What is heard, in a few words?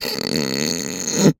human mouth suck air roof of mouth noise; sound effects one